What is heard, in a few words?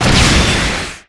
Launcher Shot; Helroid